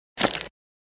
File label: Single footstep on dirt and leaves